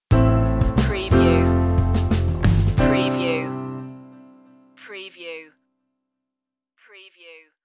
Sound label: possibilities stinger